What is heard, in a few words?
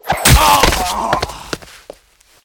glove